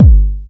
kick drum sample g sharp key

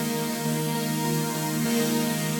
synth pluck; synth loops

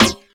present acoustic snare sound g key; snare drum samples

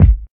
clear kickdrum G sharp key

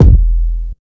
rich lows kick e key; kick drum samples

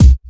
sharp kick C key; Duration 0:01; Samplerate 44100 Hz; Channels Mono

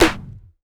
short reverb snare sample e key

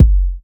raw urban kick one shot F sharp key

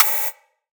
verby open high hat D# key

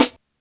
sizzle acoustic snare b key; snare drum samples

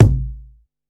prominent steel kick drum b key

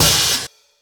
roomy open high hat sample e key

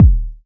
warm sounding kick drum single hit D# key

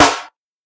long steel snare drum sample a sharp key

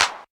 clean hand clap sound a key; single hits one shots

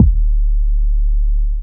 long tail reverb bass drum sound F key

